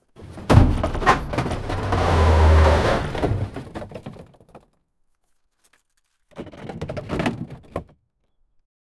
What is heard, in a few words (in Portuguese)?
pegando uma caixa do port, truck